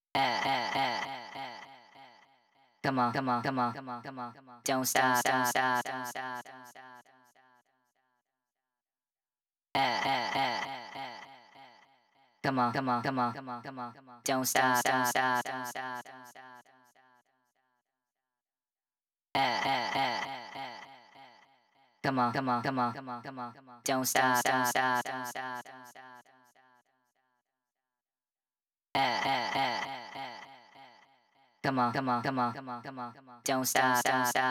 100 bpm

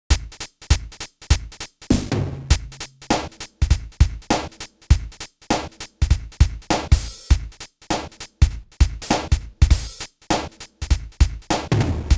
drums

